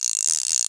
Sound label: fishing pole roll; MiniGames